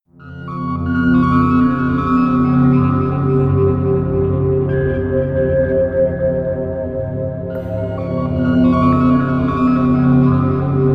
metallic ambience

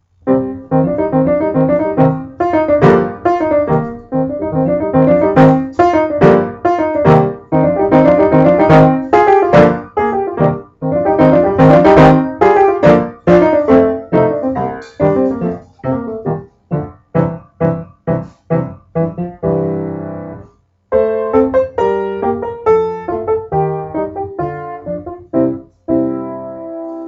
another march; juvenilia; marches; keyboard

